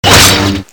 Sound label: sword hit; weapons